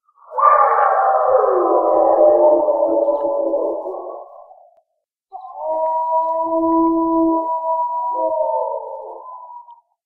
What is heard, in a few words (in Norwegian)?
Ulv; Rovdata